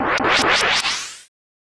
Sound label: Beam